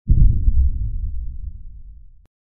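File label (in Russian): взрывы под водой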